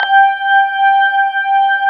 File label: B3 HAM, Keyboards Old School; USB Soundscan vol.09; AKAI